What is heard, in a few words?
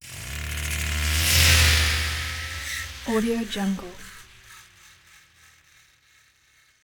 engines motors; soundbits soundfx